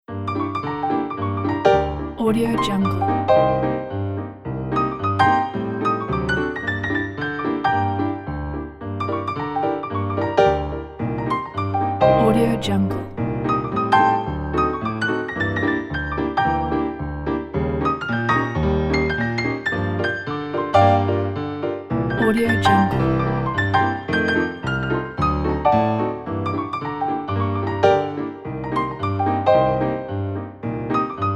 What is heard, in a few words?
shy ragtime